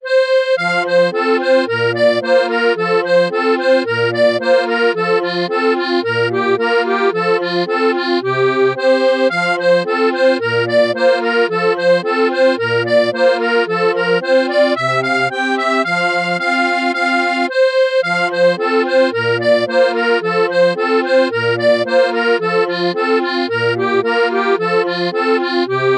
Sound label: fisher's hornpipe, folk